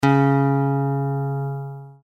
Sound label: D flat, guitar, E flat tuning; tune your guitar